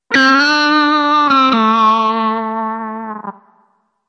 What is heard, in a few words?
weird two, Guitar Noizes